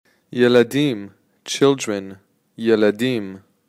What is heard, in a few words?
children